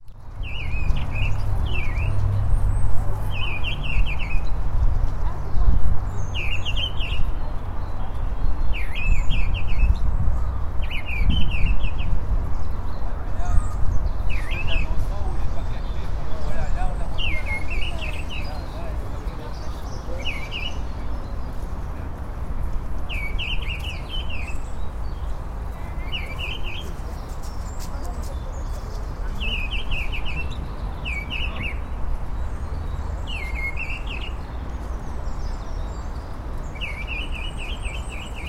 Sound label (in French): Grive Draine; Vincennes